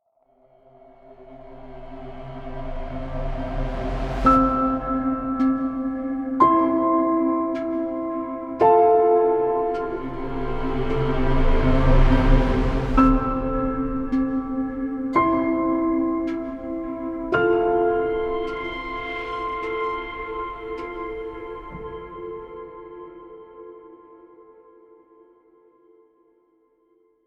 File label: Cinematic Piano Ident Logo